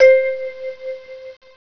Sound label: VIBES C4; Alone In The Dark Demo